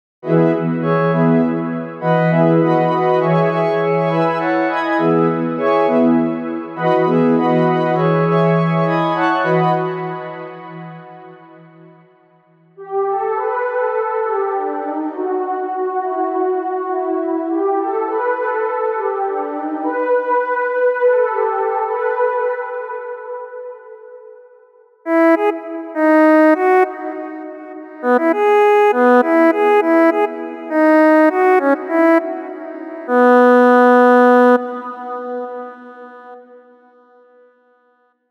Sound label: Melodies Gospel